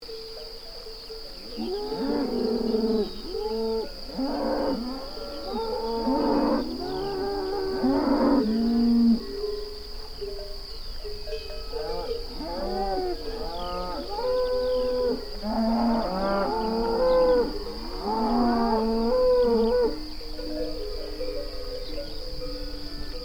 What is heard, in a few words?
Little Tibet Abruzzo